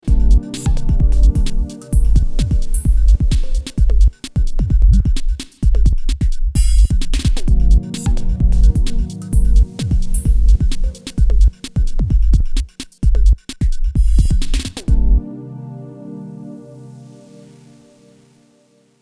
Midas 512 EQ Elektron Song 100Hz 12dB Shelf 10kHz 10db Bell